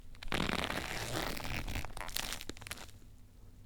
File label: Vine Stretch